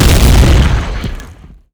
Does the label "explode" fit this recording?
Yes